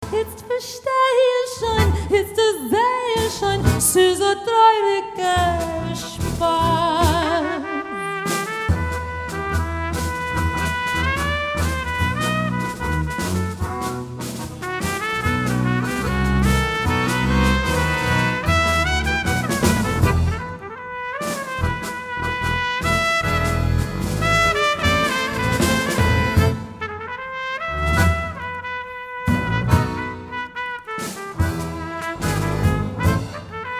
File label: tango